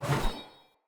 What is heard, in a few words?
Player Sphere Polearm Heavy Windup Fill Whoosh; Weapons